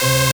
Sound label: Synths Root C